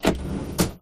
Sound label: door open